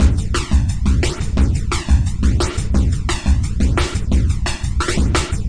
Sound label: bad 80s inside reverb, drum loops and breaks, 175 bpm; 161 to 180 bpm